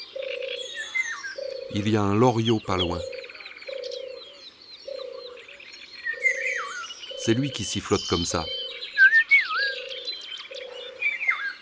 Loriot d Europe; ABC des chants d oiseaux

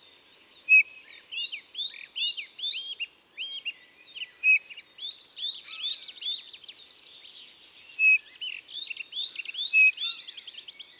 Bouvreuil pivoine; Isere; Concerts naturels de l'Isere; Studio Les Trois Becs

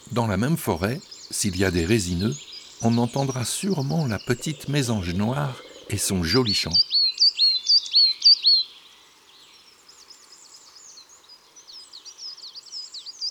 La Mesange noire; chants d'oiseaux

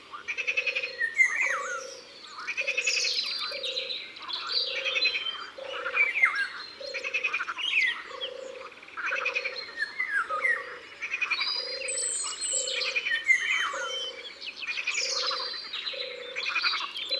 Loriot d'Europe, Concerts Naturels de la Drome; Studio les Trois Becs

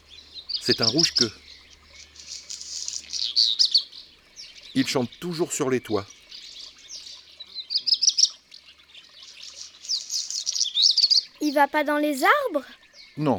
le rougequeue noir; cuicui et compagnie

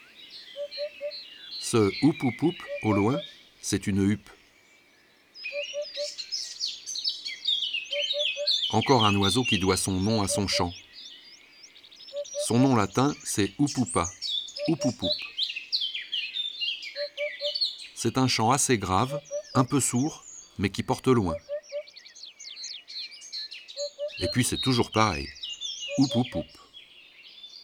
Huppe fasciee ABC des chants d'oiseaux

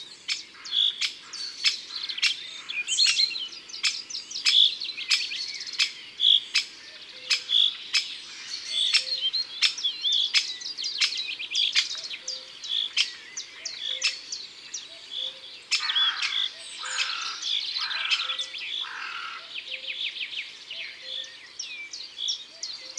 Pic epeiche cris ABC des chants d'oiseaux

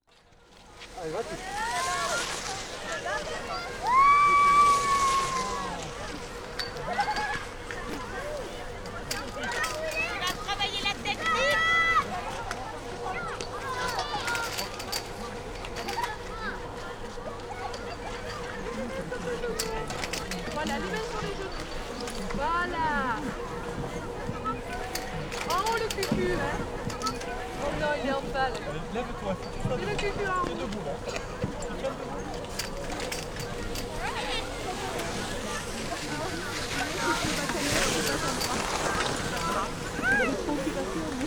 Un apres midi a La Croix de Bauzon, Ambiances Sonores d Ardeche; Studio Les Trois Becs

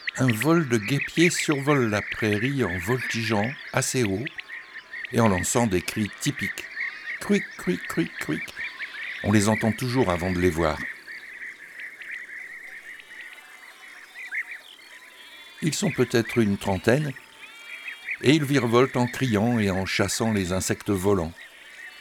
Le Guepier d europe, chants d oiseaux

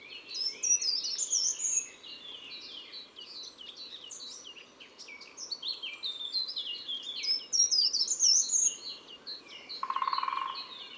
Grimpereau des jardins; ABC des chants d oiseaux

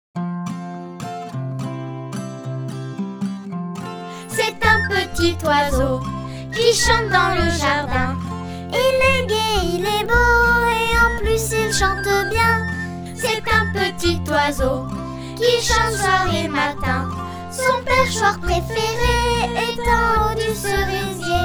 Le chat et l oiseau SONG

